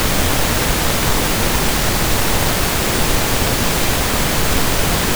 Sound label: Test Tone; ASUS; Asus A7V880; SoundMAX; SM Sensa